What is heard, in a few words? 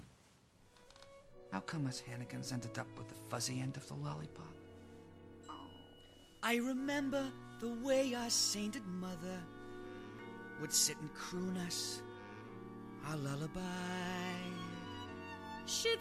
sing along; Annie; rehearsal materials